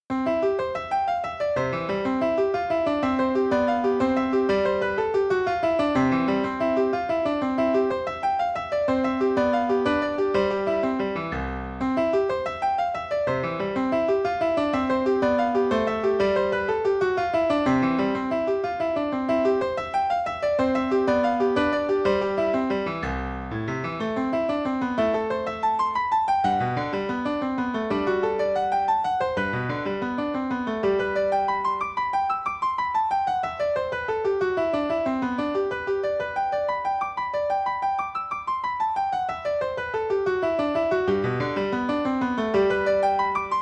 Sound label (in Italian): Composizione Forse Bagatella Per Pianoforte; Kafka; Ludwig van Beethoven